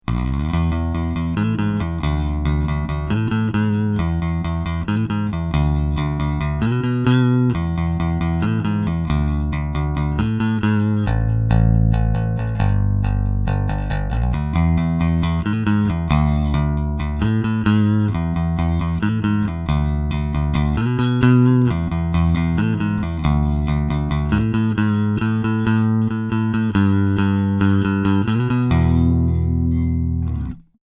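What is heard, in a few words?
bass unprocessed; White; white2a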